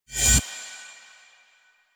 Choir Eyes End; Warframe

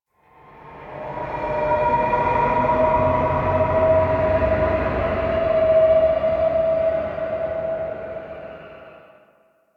Exterior Eerie, Ambience; Warframe; Duviri

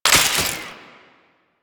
Belt Fed Rifle Mech Stop; Weapons; Warframe; Tenno; Frumentarius

